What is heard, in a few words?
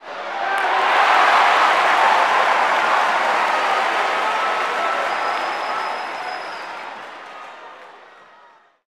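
Basketball Crowd Cheer; SFX Kit; Crowds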